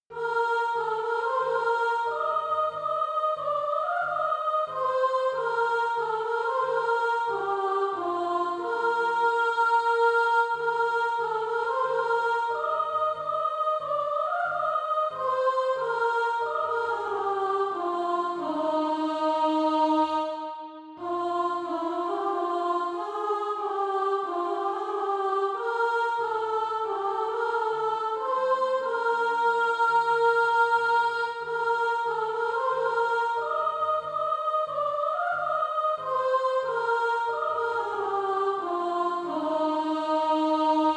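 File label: soprano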